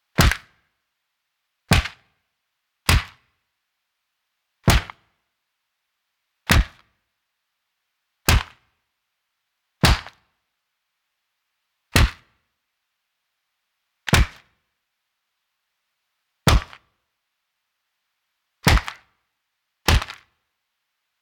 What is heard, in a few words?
Kung Fu Punches